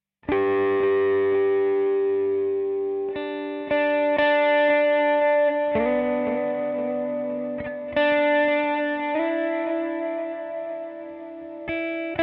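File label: G 95 guitar impro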